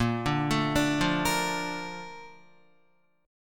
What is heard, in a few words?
Bb Major 7th, Bb, down, Guitar; BbM7 arpeggiated; JGuitar